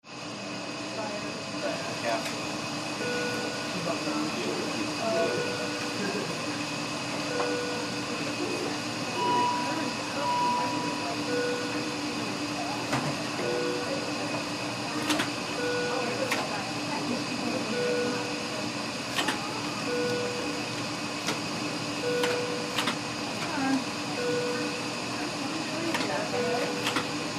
HOSPITAL EQUIPMENT HOSPITAL: Intensive care unit, general atmosphere, busy.